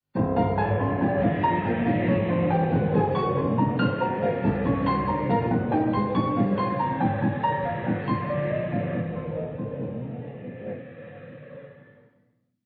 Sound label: Music Effect Piano; Nosferatu The Wrath of Malachi